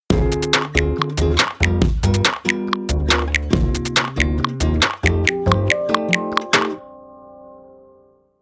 beats; WindCanada

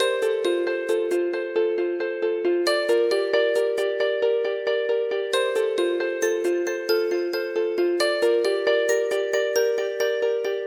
Bells Alarm; MobiWire; HomePhone 4G; alarms

